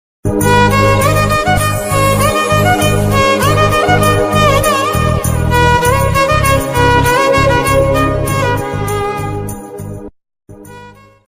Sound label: south indian movie ringtone; Remo